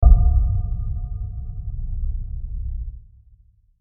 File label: Long Deep Bell Impact; Low Impacts; Crafted Signals